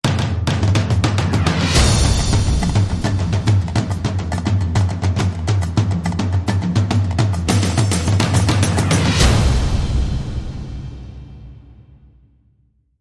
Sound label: Jungle Rock Drums & String